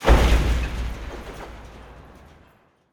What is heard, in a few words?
Object Destroyed; virtual city windows gamerip 2009